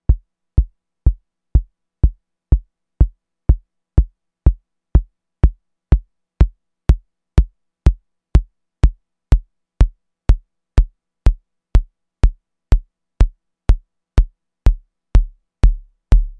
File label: drums start with full pitch zero decay zero click